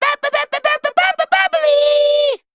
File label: male kobold attack